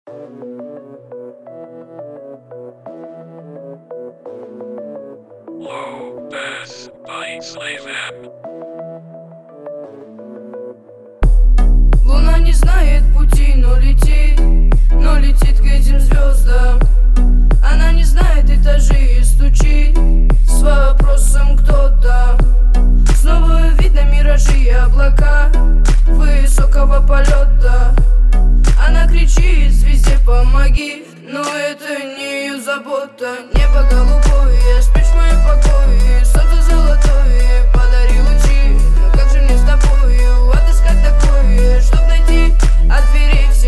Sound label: Low Bass, 41-39-37 Hz